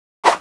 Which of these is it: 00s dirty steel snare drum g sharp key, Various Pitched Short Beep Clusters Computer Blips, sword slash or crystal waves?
sword slash